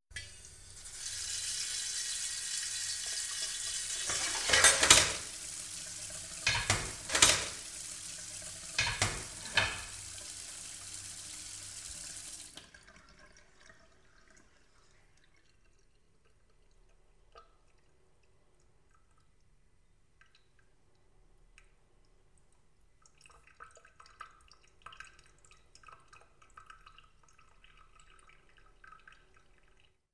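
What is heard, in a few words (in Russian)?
posuda kuhnya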